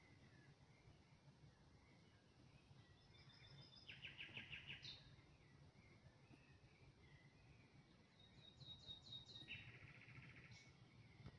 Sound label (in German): Dawn Chorus Nachtigall, Eine Nachtigall etwas weiter weg sang hin und wieder; am frühen Morgen; Die Temperatur lag am frühen Morgen bei unter 10°C; Der Dawn Chorus 2025 fand wie jedes Jahr im Mai statt